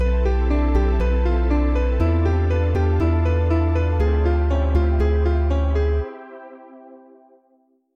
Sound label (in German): disharmonie komplett